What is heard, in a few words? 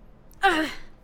Hurt, human male villager; customnpcs